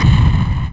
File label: Impact